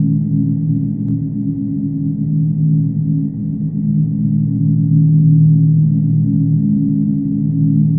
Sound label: Drone Ambient; Drums & Drones